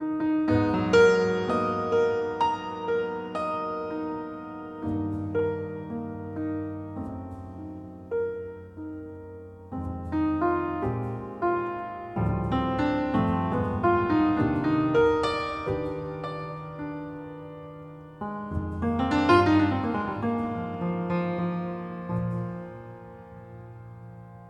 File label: Grand piano; akg bx15